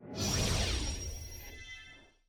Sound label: loot tray receive material key; lol